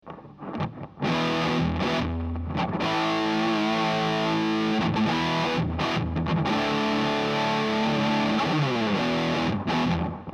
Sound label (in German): orange voll ezerre humbucke